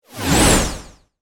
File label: Laser Beam Pass By, Whoosh; Heavy; BlastWave